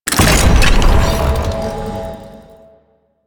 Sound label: Hack Minigame Start